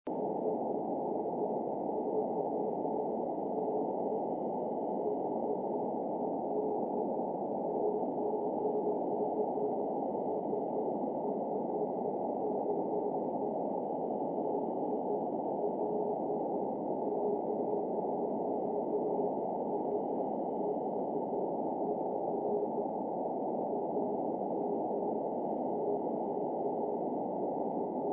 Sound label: 10GHz EME BEACON 10368MHz; DL0SHF